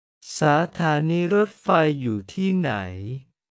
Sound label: Music; jezyk tajski